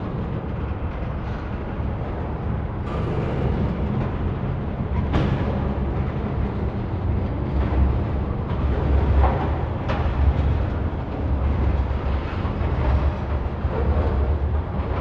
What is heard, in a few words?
train warehouse loop; ambient